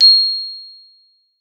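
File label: GLOP C4, glockenspiel; Xsample VOL-4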